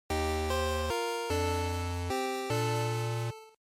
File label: Game Over; GB; RPG